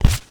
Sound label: plunger, percussive